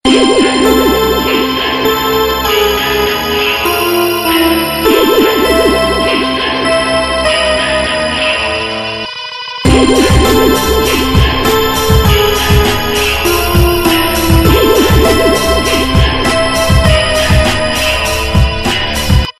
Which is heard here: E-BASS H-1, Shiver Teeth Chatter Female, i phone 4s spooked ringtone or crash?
i phone 4s spooked ringtone